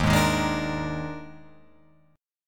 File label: Eb Major 7th Suspended 4th Eb, Guitar, Open F; strummed; EbM7sus4